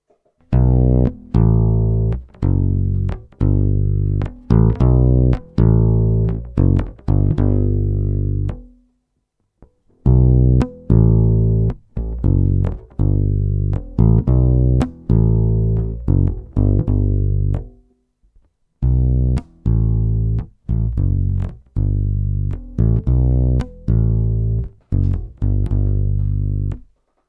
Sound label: pickup